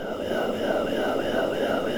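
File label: Music dpad Black Hole 120; from dust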